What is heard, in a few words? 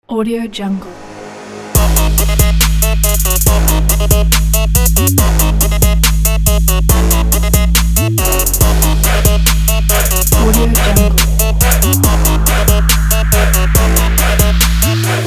this trap